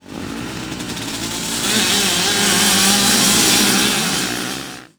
start

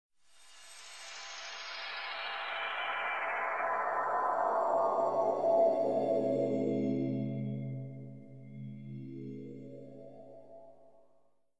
theme stinger; Myst III Exile

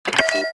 button; Star Wars Jedi Knight II Jedi Outcast; XBOX; switches

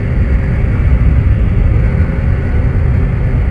large plat move lp, movers platforms

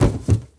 bodyfall metal

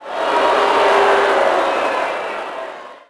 NEG Reaction,Crowd; Cars; XBOX

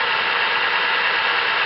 tread, sled; WhiteOut

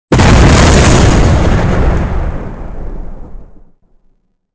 explosion punch; weapons; Soldier Of Fortune II Double Helix; XBOX; explosions

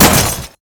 Sweetner Glass, impacts; Totaled; XBOX

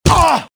die, pain death, male; Soldier Of Fortune II Double Helix; XBOX

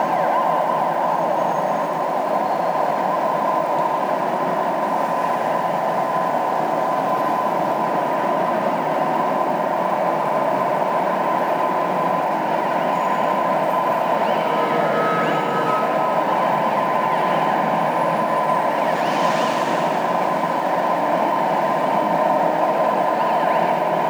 city medium police car; ambient; Project Gotham Racing

